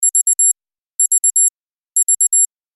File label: clock alarm; HTC M8